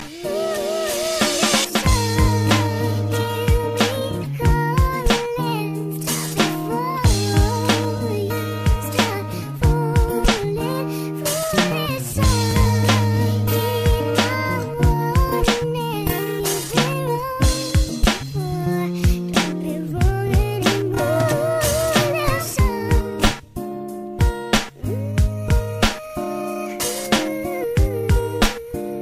Heart Beat

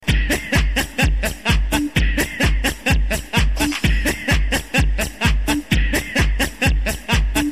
Creepy Laugh

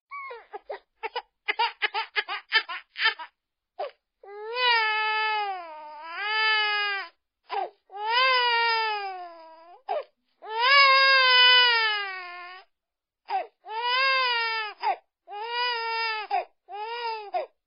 Baby Crying

